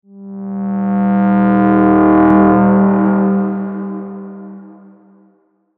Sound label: scary swoosh; tabatatimer